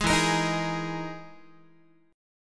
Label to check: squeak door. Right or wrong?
Wrong